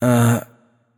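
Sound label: nonverbal seriously; voicepack; smite; merlin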